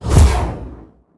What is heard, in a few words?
portal emerge